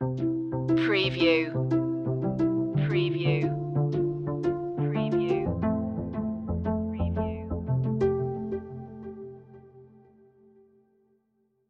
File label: under the lights stinger